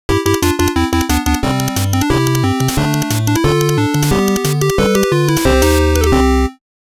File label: Result Win Stage Clear